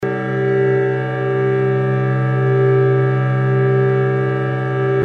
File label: microtonal variations of the all interval chord akkord c g e a cis fis